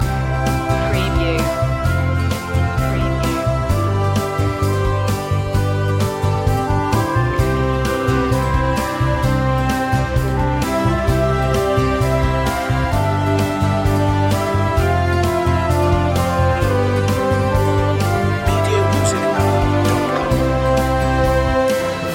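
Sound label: orchestral loop; o come all ye faithful; media music now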